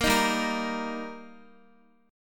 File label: G Minor Sharp 5th G Guitar, chord; Gm#5 strummed; x,x,8,8,7,5